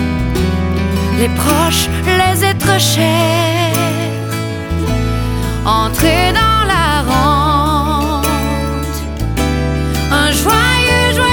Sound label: Acoustic